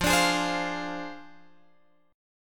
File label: F Minor 6th F down Guitar Drop D